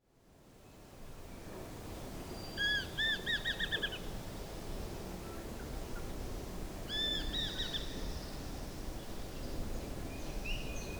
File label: Besra Accipiter virgatus affinis, besra call